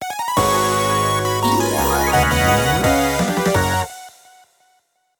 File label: Jingle Title; Taiko no Tatsujin V Version; PS Vita